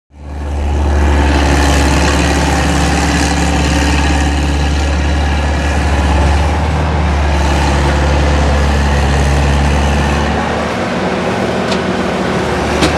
Demolition Site Atmosphere; Demolition Site Atmosphere. Mechanical Diggers At Work.